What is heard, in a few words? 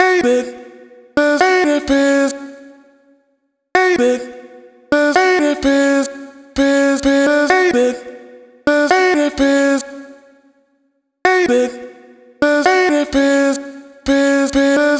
Omnivox Vocal chop 128 Bm; Ateit; Slate Digital Omnivox Vocal Sample Pack; Chops